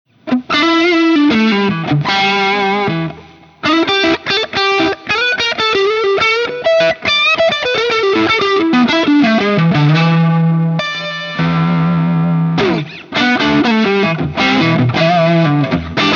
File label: Vox AC 30